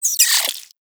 Vocal Pain, Hunting Critters; Nexifera

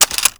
Grineer M16 Drop Clip; Weapons

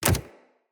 companion step default, footsteps; Pets; Zanuka

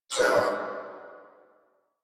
pain crewmen; Warframe; Corpus Crewmen; Crewman B

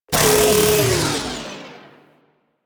Proto Infested Mutalist Death, Vocals; Warframe

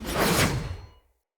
Knight Axe Whoosh Heavy; Weapons; Enemy; Axes; Snc Knight Axe

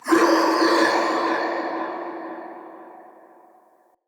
Ghost Attack, Vocals; Characters; Enemy; Nightfold Test Range Ghosts

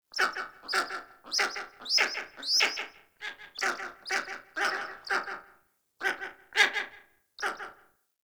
Ambient Bird Calls; Ambience; Duviri

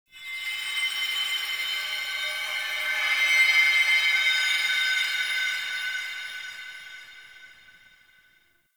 Glass Particles; Warframe; Ambience; Nokko Colony

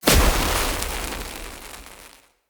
Hunter Sniper Shot Projectile Burst

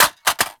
Reload Drop Mag Default; Warframe; Boar

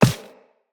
Walk Default, Footsteps; Operator

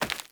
Grunt Step Default, footsteps

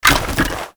Bunraku Puppet Movement; Warframe; Koumei

